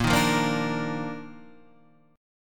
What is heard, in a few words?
D sharp Major 7th Suspended 2nd A sharp